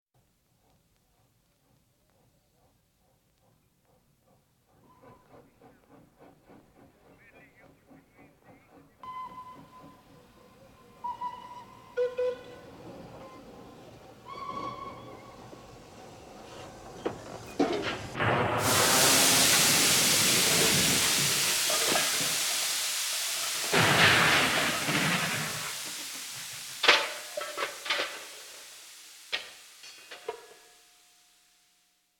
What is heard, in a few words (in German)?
zusammenstoß von 2 dampflokomotiven; Katastrophen